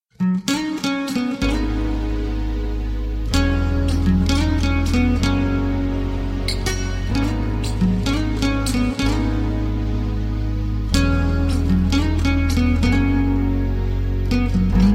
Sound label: Guitar